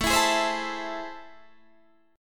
A Minor Major 7th

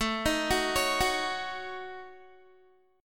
A 6th Flat 5th, Guitar; A6b5 arpeggiated

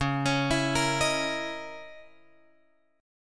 C sharp Minor 6th Add 9th C sharp

